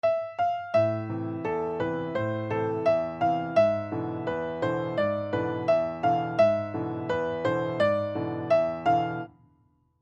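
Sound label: incomplete musical phrase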